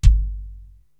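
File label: BD Woofer, bass drum, drum machine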